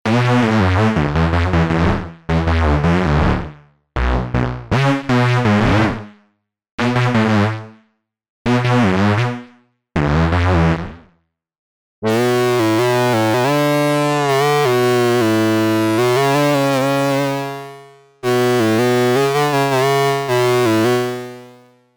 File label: Vacuum Leads